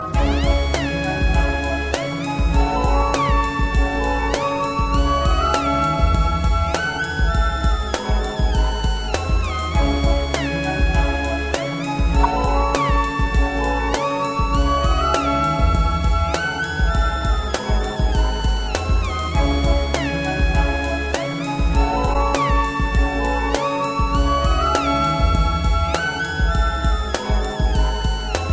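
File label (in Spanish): base rap para improvisar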